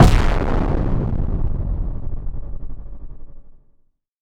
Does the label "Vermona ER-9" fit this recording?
No